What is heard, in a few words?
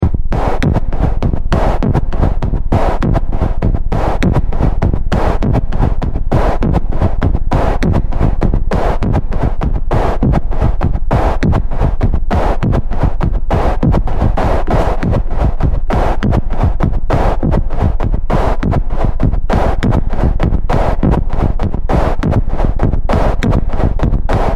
Mechanical Rhythm, Thumping mechanical robotic production rhythm.; Machinery; Machines